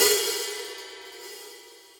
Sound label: HIHAT side open